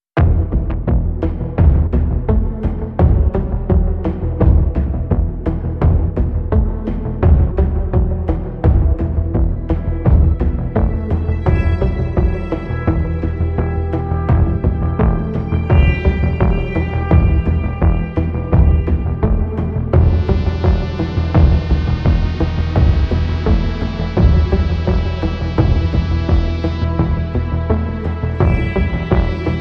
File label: No Piano